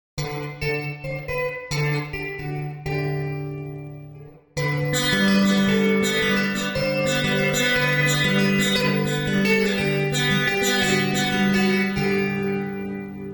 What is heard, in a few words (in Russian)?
Играем на гитаре